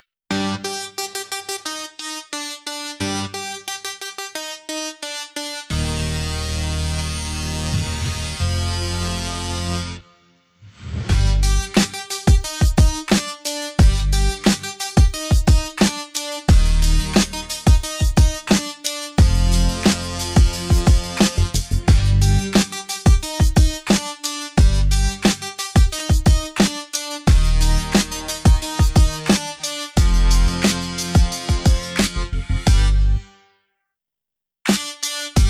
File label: Instrumental